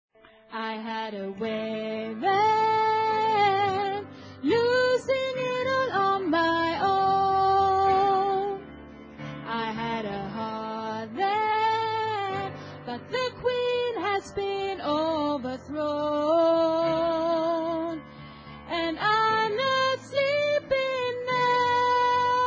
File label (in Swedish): sopran